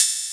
Smooth Open Hat Sample D# Key, Royality free open hat sound tuned to the D# note